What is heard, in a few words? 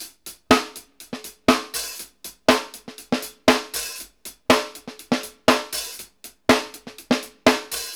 HAT SNSTRA; Sampleheads; New York City Drumworks VOL-1; KD JBEAT 120